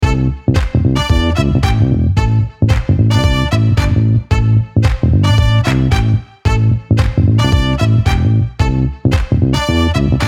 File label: Brass Acid Disco